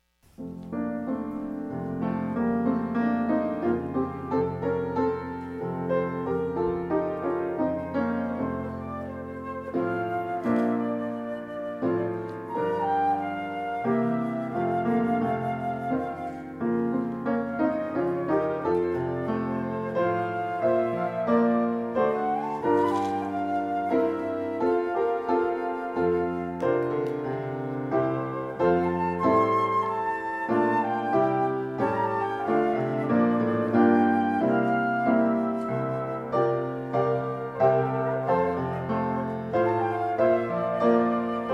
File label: no other plea flute; carol